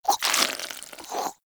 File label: Armadillo Tongue Move; Warframe; Characters; Hunting Critters